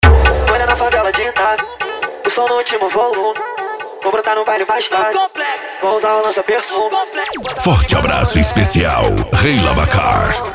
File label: funk mega bass